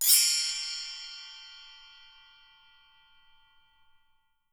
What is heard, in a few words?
Percussion & Harp; Miroslav Vitous